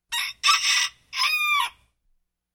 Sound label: Rooster fledgeling